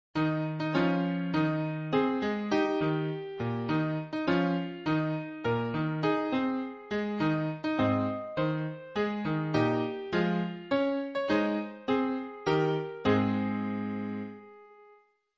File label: Happy Birthday To You